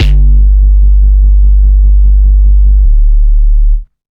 hard bass drumz

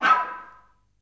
FL FX, Advanced Orchestra

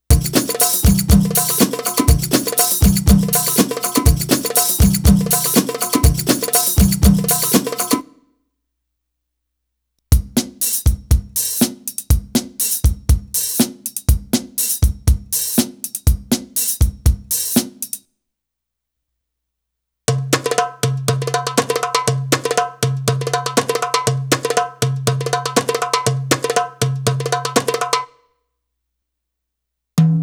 Arabic Rhythms

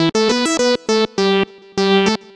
Synth Lead; Trip-Hop; Loops; Sonic Foundry (Sony Creative Software); Rondo Brothers Trip-Hop Loops & Samples; Liberation

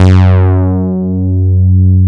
PA, ANA PAD

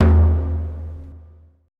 ETH DRUM C

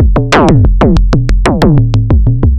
FM Perc Loop, Analog Glitch

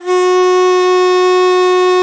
BON DI, Winds, Old World Instruments

